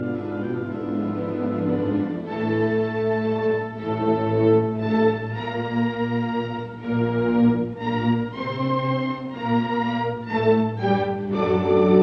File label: Violin Concerto Allegro non troppo Cadenza; Brahms; in D major; Op 77; Max Fiedler